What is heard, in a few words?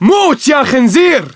t die pig; cscz npc